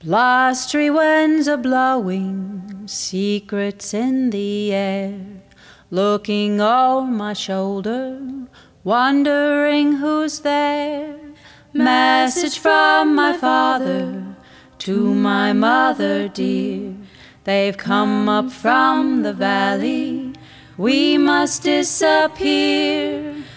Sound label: Russian Lullaby